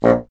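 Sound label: wind bassoon A; Miroslav Vitous; Solo Instruments VOL-1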